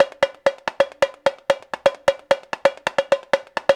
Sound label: RIO, Rio Batucada 130 BPM